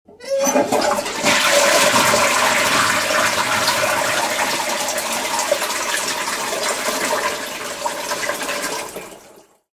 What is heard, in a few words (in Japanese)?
トイレの水を流す音